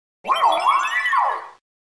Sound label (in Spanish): Grito